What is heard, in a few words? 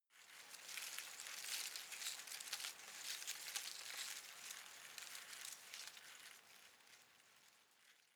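shrub small leaves rustle soft, garden; haus-garten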